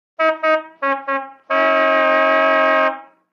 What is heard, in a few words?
1940 Car Horn Brassy